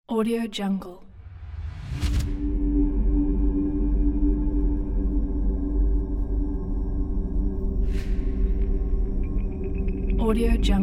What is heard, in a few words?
haunted monastery